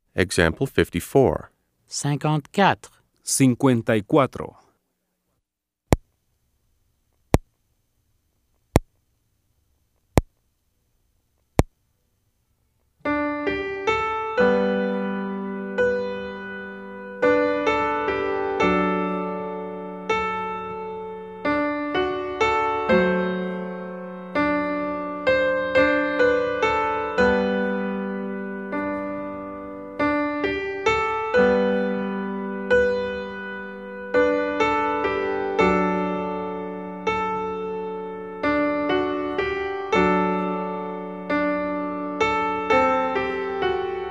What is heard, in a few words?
Keyboard